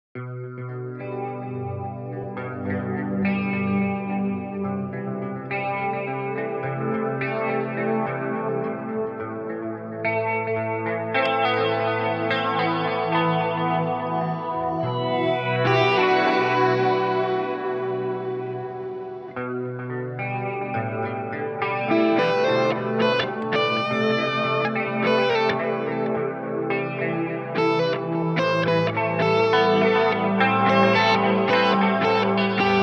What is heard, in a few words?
waves